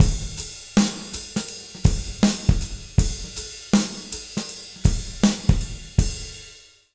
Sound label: 080 J ROCK, Jazz Latin Drumloops; USB Soundscan vol.08; AKAI